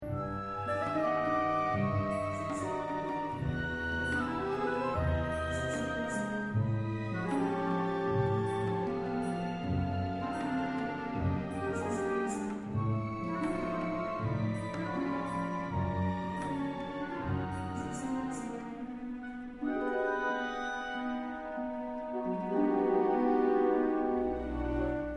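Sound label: Music Desert Ambience; Star Wars Galaxies An Empire Divided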